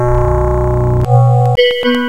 Kawai K3; Rubber Chicken Software Sample Bank

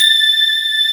SYNTHESIZER DIGI DECAY

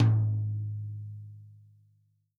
TOM, Real Drum Kit, POP KIT

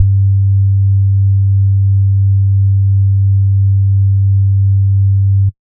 SBASS G1, Sub Bass Sine; neuro; Stanza

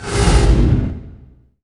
IMP FLAME, Cinematic Impact

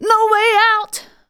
vocals sung; Techno Trance Essentials

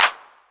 Clap, Novation Drumstation

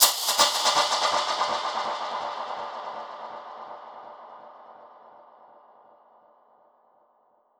Perc Hit, dub percussion; musicradar

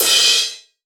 CRASH, OLD ZIL CRS

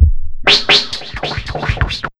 150, Big Beat Drumloops

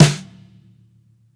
SNARE LOUD; ONE SHOTS; SNARES; Basement drums vol 2

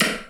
Thunder Drum

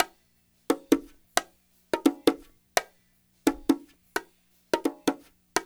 CONGAS, Funky Ass Loops; East West

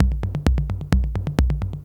130 addon, DRUMLOOPS, HIHAT; Ueberschall; Techno Trance Essentials; LOOP ADDON3

